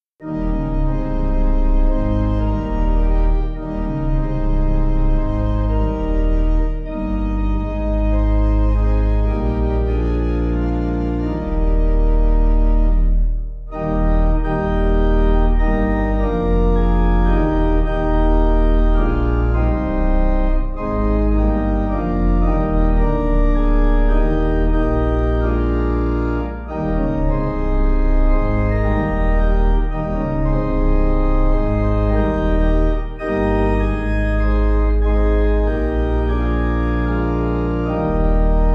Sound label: In Memory Pipe